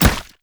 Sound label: crab